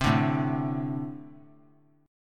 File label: A Minor Double Flat 5th, down; strummed; JGuitar